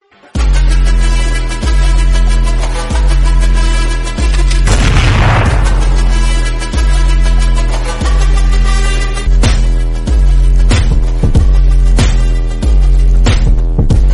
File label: Bgm; Indian Army